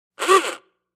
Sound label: Old Klaxon Car Horn, antique horns